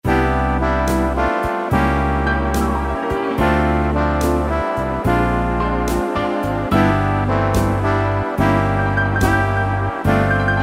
Easy Listening, Yamaha PSR-3000, Factory Styles, Swing & Jazz